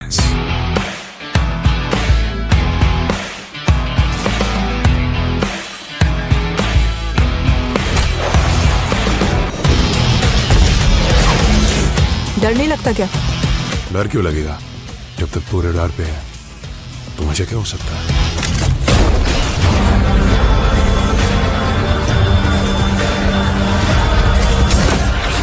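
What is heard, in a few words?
Trailer background music Ringtone; Operation Valentine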